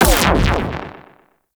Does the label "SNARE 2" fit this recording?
No